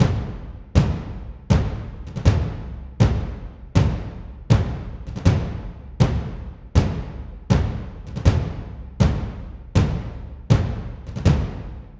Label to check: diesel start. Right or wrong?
Wrong